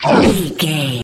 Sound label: troll monster attack fast